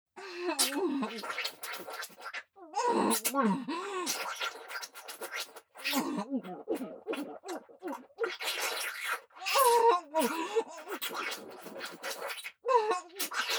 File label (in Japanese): くわえ込むフェラ（喘ぎながら）; レイナ（姉）